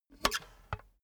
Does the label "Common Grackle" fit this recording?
No